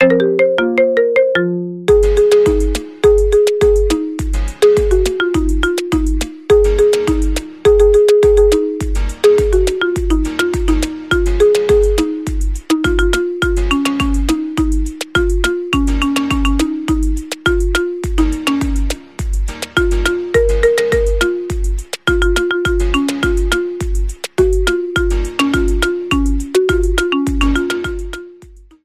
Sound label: marimba remix